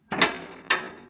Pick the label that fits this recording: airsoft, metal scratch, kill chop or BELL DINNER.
metal scratch